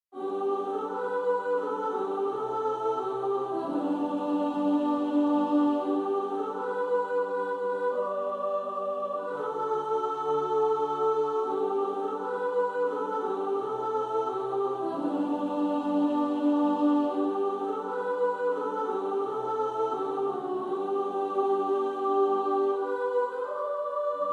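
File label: soprano, practice music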